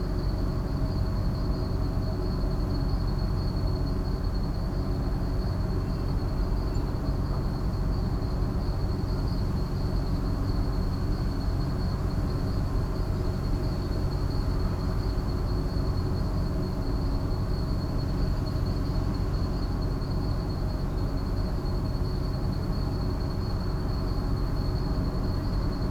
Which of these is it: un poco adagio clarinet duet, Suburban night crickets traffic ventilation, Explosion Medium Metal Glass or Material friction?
Suburban night crickets traffic ventilation